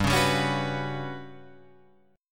G Major 7th Flat 5th

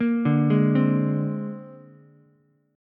C 7th Suspended 2nd Sharp 5th, C, down, Ukulele; arpeggiated; chord; C7sus2#5

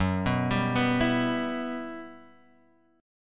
E Minor F sharp, chord; Em/F# Chord {2 2 5 4 5 X} arpeggiated

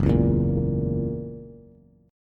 F sharp 7th, Bass; strummed; JGuitar

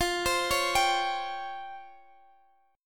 Db Major 7th Flat 5th, F, guitar

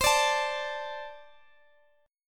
A Minor Double Flat 5th

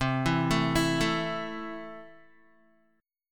C 6th C down Guitar; C6 arpeggiated

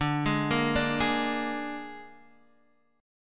Ab Suspended 2nd Flat 5th D, chord

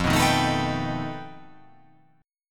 F 9th Flat 5th F down

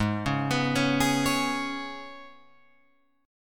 Ab Minor Double Flat 5th, Guitar, Open F; arpeggiated; 8,8,11,8,11,8; JGuitar

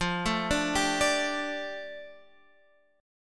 F 6th Add 9th F Guitar Drop D; x,8,7,7,8,10

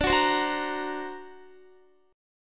D Augmented 7th D down Guitar; D+7 strummed; JGuitar

